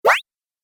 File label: 8 Bit Style Jump; video game